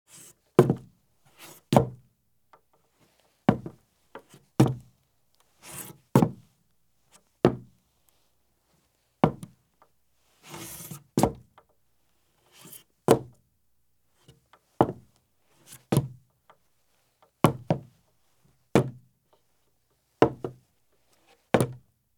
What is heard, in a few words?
Heavy Boots Make Various Scuffs on Wood Porch or Footbridge; Sound Ideas; Footstep Sound Effects